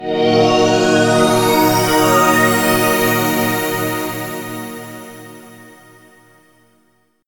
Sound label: Space Intro